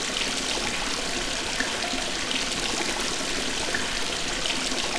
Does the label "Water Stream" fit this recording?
Yes